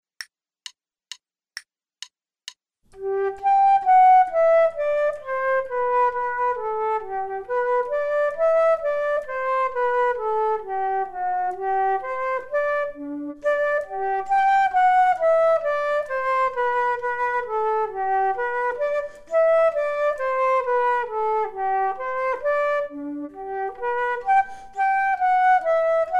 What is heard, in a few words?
Duets flute; Selected Duets for Flute Podcast